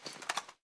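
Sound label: submachine gun reload empty rattle; gmod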